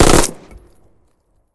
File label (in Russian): oglushayushaya pulemetnaya ochered vraznoboj